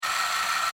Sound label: Servo Mechanical SciFi Robot Toy, Scifi Servo Mechanical Robot Small Motor Toy; BBFX